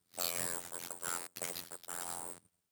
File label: universal audio apollo solo bad sample rate